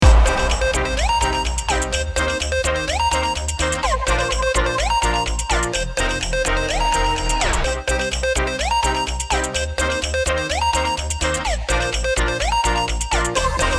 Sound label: loop active